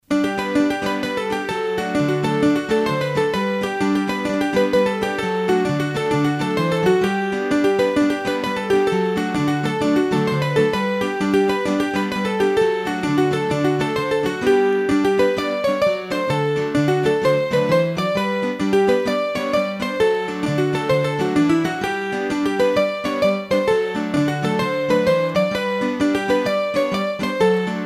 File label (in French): Marche Nuptiale trad Berry, hurdy gurdy; Massif Central